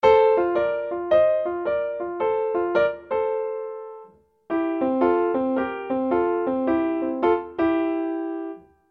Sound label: Right hand F C, piano blues